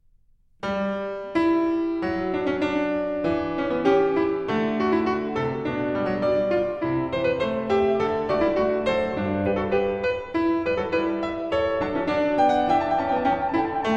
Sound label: Bach Goldberg Variations BWV988 Variation 22 - Alla Breve